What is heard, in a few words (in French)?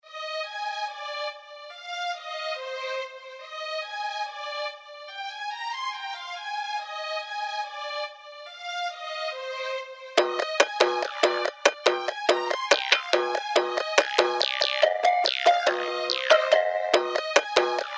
reverse violon